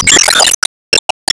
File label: Bombdaruma Skill Dead